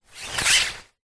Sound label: flag handling short movement pull through hand; foley; zapsplat